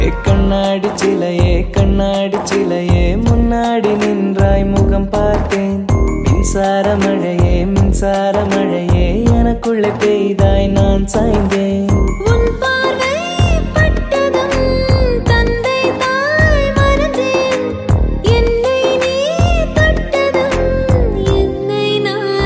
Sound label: Tamil new song